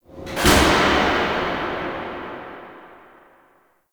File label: Subway Misc; Warframe; NineteenNinetyNine